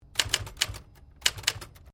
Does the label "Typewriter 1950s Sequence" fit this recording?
Yes